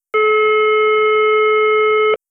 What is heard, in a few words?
wireless call; densetsu; signal